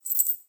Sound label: Coins; Split or Steal